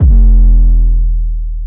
Bass; 7rystan's 700+ FREE COLOSSAL EDM SAMPLE PACK

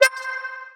Synth; 7rystan's 700+ FREE COLOSSAL EDM SAMPLE PACK; SFX

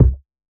kick; bespoke - Floral v.1; drum oneshots

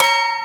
Mech hit; SFX; One shot; 7rystan's 600+ FREE EDM SAMPLES & DRUMS

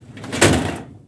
Drawer closing; Foley; SFX; 7rystan's 600+ FREE EDM SAMPLES & DRUMS

